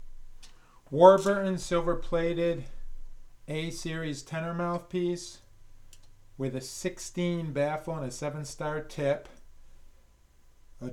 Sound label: Tenor; Warburton A16